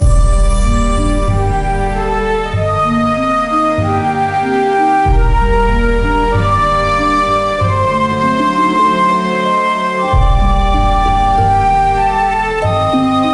Loop

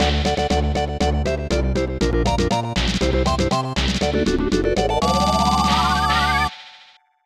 Major Victory; super bomberman 5

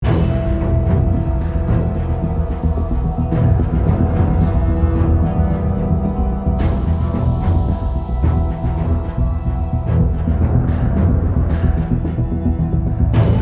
Stealth loop

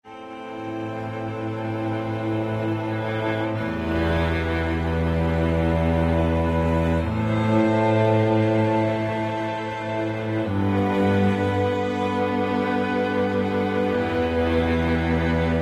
Emotional

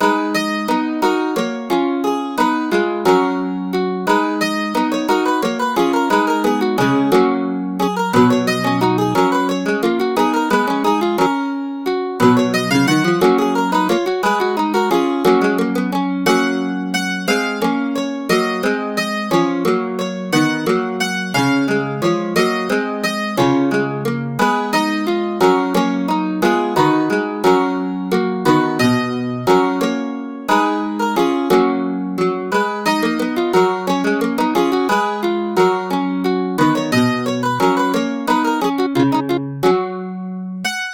Mandolin

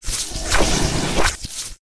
Shrine Ring

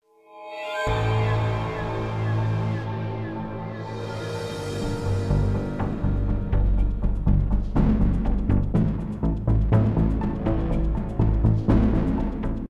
Stealth Attack; modern combat 5 blackout; android

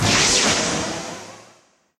zap

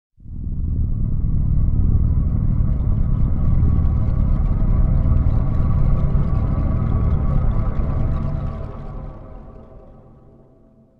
String

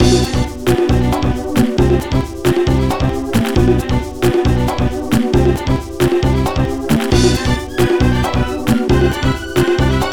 Battle; Lost in Blue 2; Nintendo DS

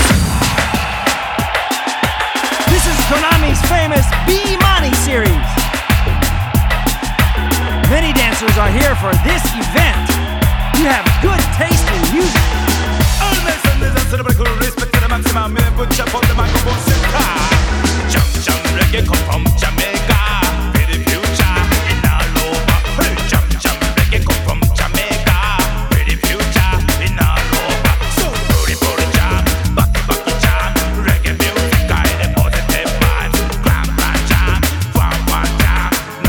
Reggae SWING MIX; Dance Dance Revolution 3rdMix

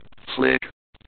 flick

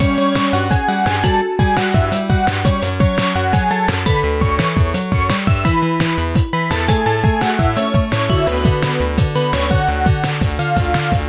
BGM Win; chicks chan factory barrett rush; android

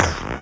perc

